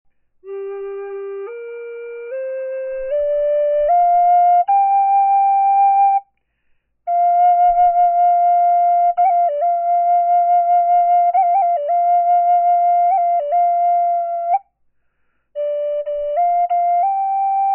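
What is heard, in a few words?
natural, flute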